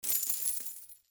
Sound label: sound receive small